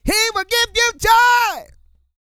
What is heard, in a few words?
SING